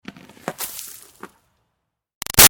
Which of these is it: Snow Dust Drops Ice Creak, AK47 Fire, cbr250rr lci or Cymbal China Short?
Snow Dust Drops Ice Creak